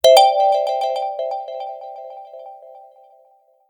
Android Notification Sounds

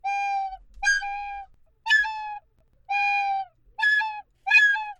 sub alert

